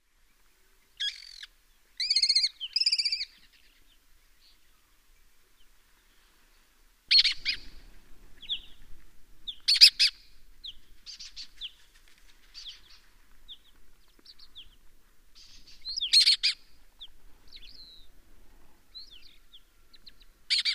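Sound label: Lesser kestrel, bird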